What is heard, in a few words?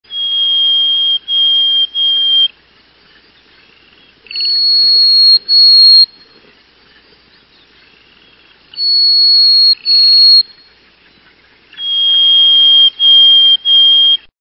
Harriss Sparrow, songs